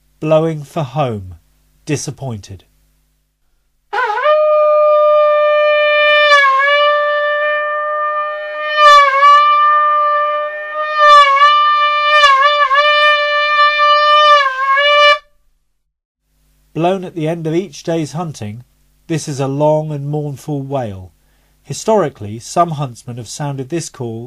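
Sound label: blowing for home, hunting horn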